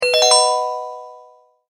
multimedia tone advance; Tones; Sound Design; McKinney Sound

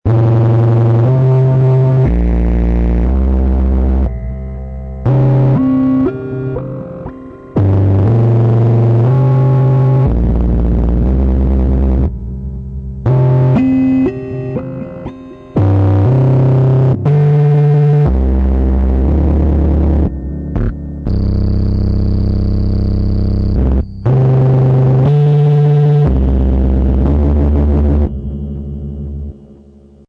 Long n Evil, high-pitched and creepy sound; High quality stereo high-pitched and creepy sound excellent for evoking fright and fear, perfect for horror-thriller genre or video gam; Scary Tone Sound Effects

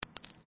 Coin Penny Single Drop Stone; Common coins; Money; Objects

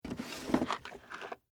Game Box Up, Board, Pick; Sports; Board Game Sound Effects

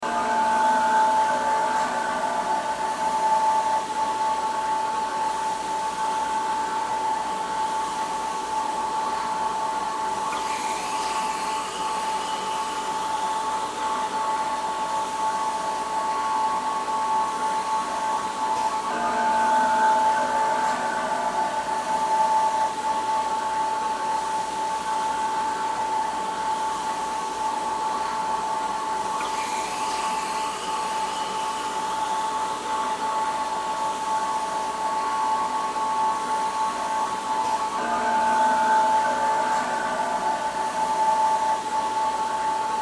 Bottle Plant Giant Blender Loop; Factory; Industry; Factories

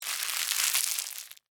Wrapper Crinkle, Foley, Plastic; Crinkling (Sound Effects:Objects); BlastWave

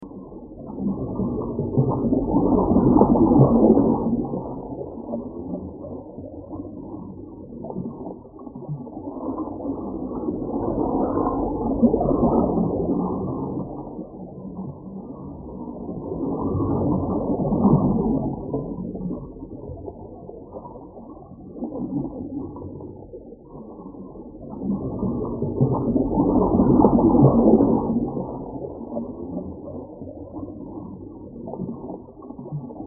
Sci Fi Plasma Shield Force Field Bubbles Liquid; Loop; Blastwave

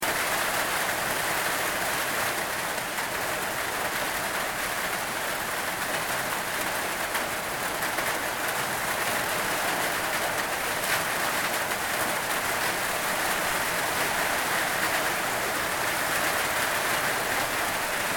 RAIN TIN ROOF STEREO; Elemental weather effects; Rain (Sound Effects:Weather)

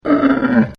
Camel Voice; Camels; Animals

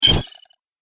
Robotic Droid Noise; Robot Sound Effects

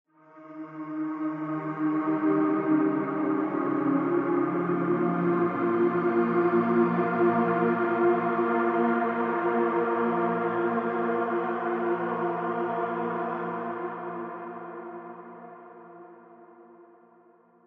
Horror Musical Swell Vox Ethereal Haunting; Scary Soundscapes; Blastwave

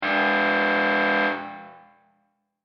Sports Buzzer Arena Stadium; SFX Bible

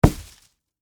Snowball Impact, Weather, Snowball, Impact, Big; Snow Sound Effects; Snow (Sound Effects:Weather); BlastWave

